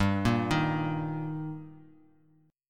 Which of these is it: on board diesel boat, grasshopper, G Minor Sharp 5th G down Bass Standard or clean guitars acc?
G Minor Sharp 5th G down Bass Standard